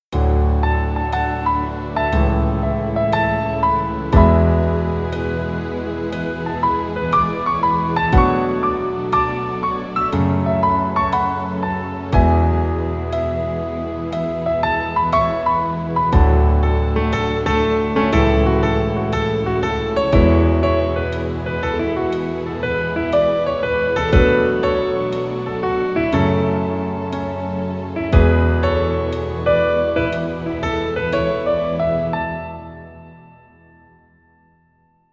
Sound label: piano